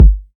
hot steel kick drum g key, kick tuned to the G note. Loudest frequency: 82Hz